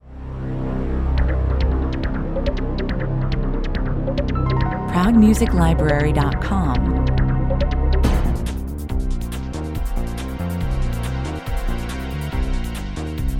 cyborg fugitive running 140 bpm, A fast-paced electro-techno Science Fiction underscore; ideal for reflecting a cyborg futuristic atmosphere. Pulsating analogue bass synt